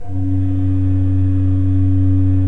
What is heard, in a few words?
F1, Cathedral Organ, KOPPELFL.; Propeller Island